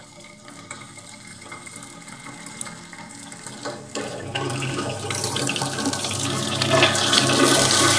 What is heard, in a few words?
toilet; Звук сливного бачка